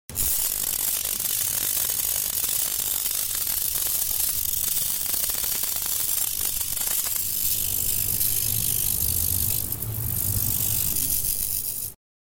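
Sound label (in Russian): Ловушка для насекомых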